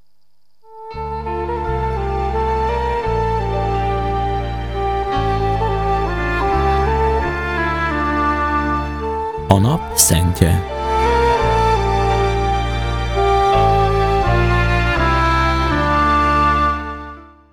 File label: Jingle; A Nap Szentje